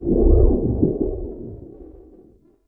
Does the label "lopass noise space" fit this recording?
Yes